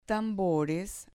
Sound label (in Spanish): tambores